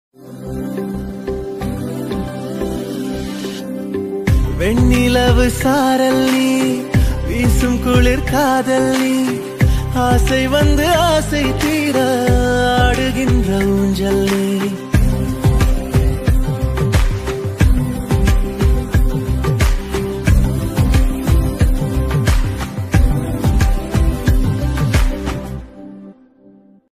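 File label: background music ringtone; Amaran; Sai Pallavi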